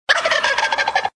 Gobble

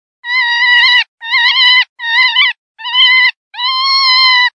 Yellowhammer

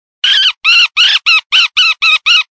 Baby Woodpecker

